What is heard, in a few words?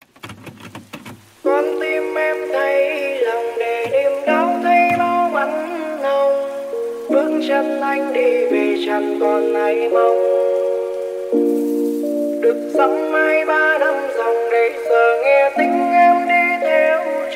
Lofi Version